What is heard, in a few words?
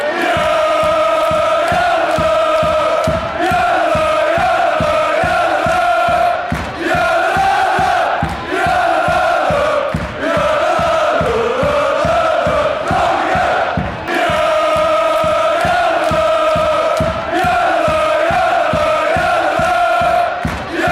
jalalalalalala fan chants; groningen